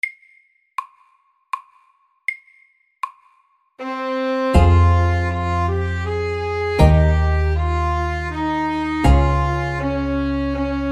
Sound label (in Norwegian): Folketone MIDDELS